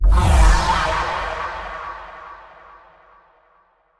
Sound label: Fall, Hits & Falls; Zero-G Trance Inducer